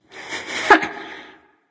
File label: heh heh; Smite; athena